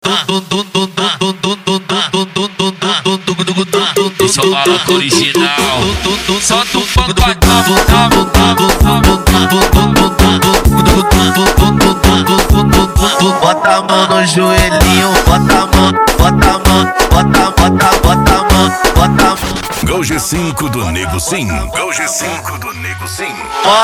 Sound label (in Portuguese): funk mega bass e noia dance